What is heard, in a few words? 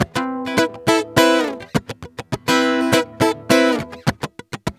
GUITAR SAMBA